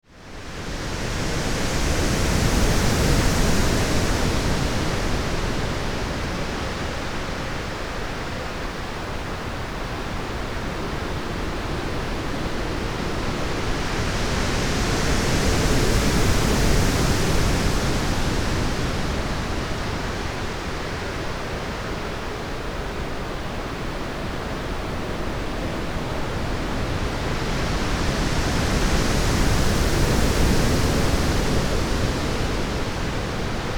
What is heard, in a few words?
ocean and wind